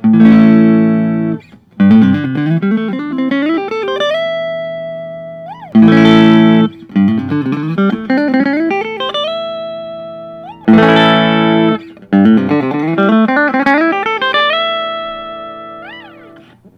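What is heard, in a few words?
fender custom shop 61 telecaster; wildwood 10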